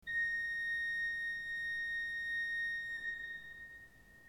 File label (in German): ton, orgel